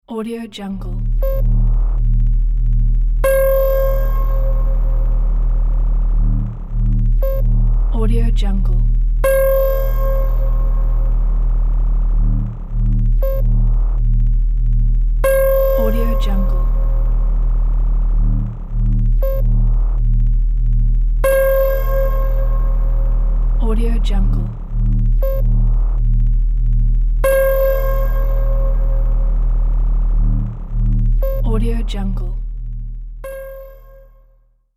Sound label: aliens signal